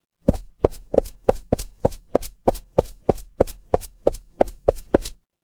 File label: footsteps concrete